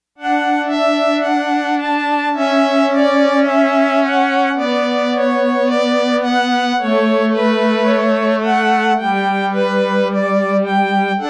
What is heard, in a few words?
Strings, Yamaha TX802; Factory Patches; Voices